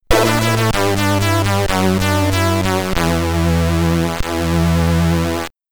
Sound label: Game Over (SID Stereo); Shadow Skimmer; commodore 64